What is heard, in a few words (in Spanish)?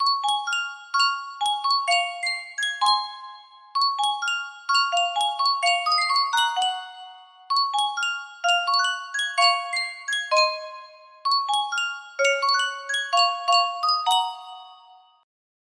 Yunsheng caja de musica